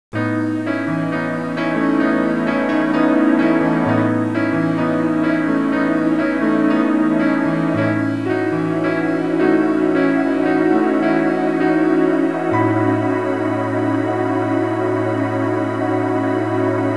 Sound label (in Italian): audio suonerie musica mistica